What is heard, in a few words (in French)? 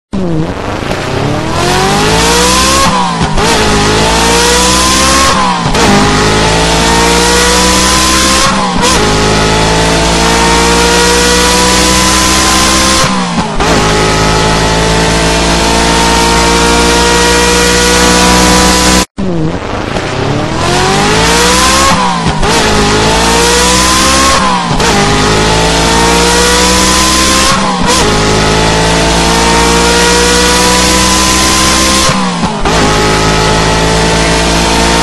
Voiture Ferrari